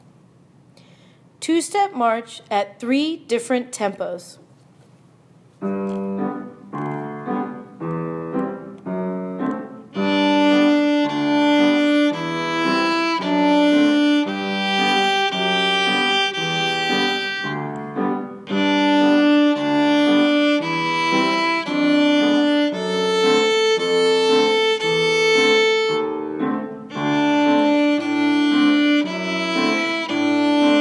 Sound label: Two Step March with Piano 3 Tempos